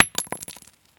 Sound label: grenade collision concrete; Escape from Tarkov